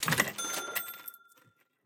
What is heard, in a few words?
Enter Shop; Game rip; MiSide